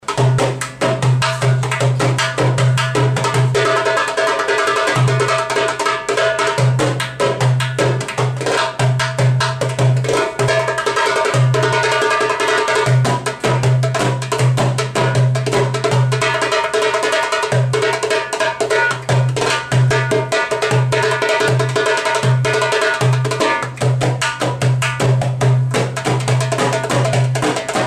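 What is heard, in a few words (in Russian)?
восточный барабан дарбука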